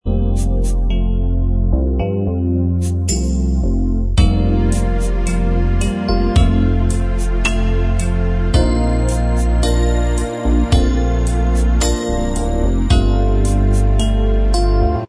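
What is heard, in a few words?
duet instrumental track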